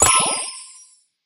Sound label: crash starblock; SpaceTrain